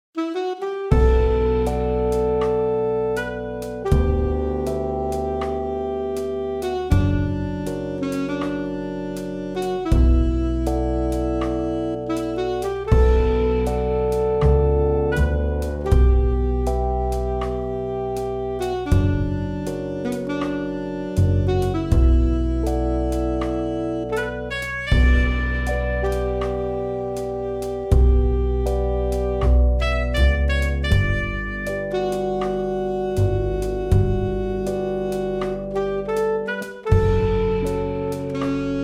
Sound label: Velvet Sax (Band); three arangments solo band synth